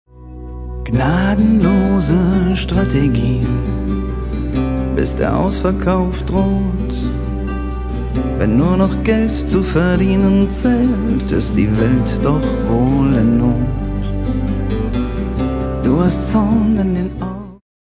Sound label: unplugged version